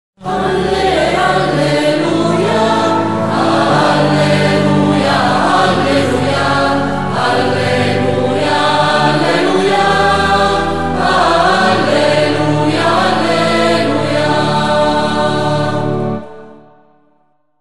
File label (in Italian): alleluia passeranno, Corale